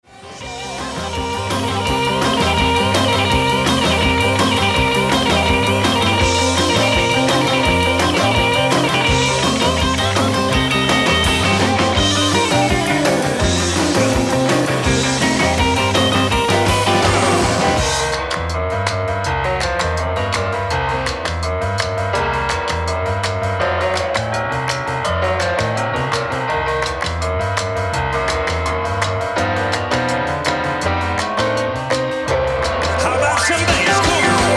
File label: LOOP